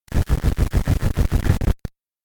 Digital Distortion Static Blast; Scifi Science Fiction; Sound Design

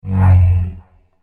Heavy Laser Beam; Laser Gun Sound Effects; Andrew Fleming

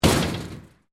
Metal Plate Impact; Materials; Foundation

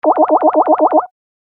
Slow Beeps X8, video game sound effect

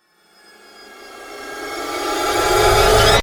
Riser Suction; Ascends; Sound Design

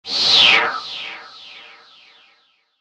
Whoosh Filter Static Delay; Blastwave; Whoosh Sound Effects

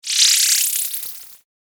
Surreal Laser Swish; science fiction sound effect

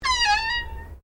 Door Pivot Interior Wardrobe Louverd Squeak; Various doors; Miscellaneous Doors

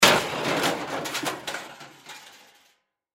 Impact Debris Junk Fall Crash; Sound Effects:Materials; Blastwave

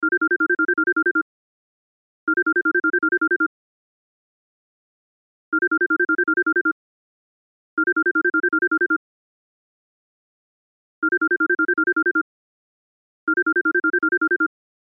Cell Ringtone; Loop; Cell Phones; Blastwave

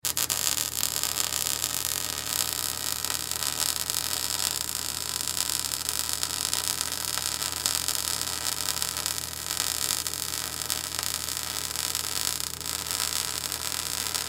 Welding Gun Spark; Welders; Machines; INDUSTRY; Blastwave

